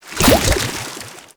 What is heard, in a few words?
Paint Pickup; Gameplay; Warframe; Shadowgrapher